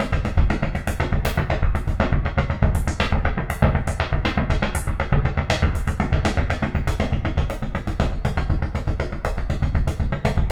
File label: synth beats; Zero G; Malice In Wonderland